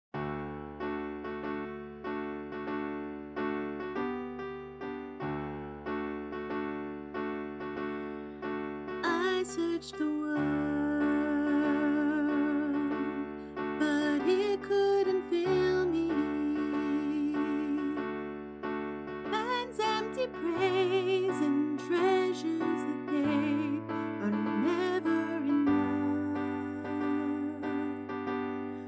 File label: Alto; Practice Recordings; Octavos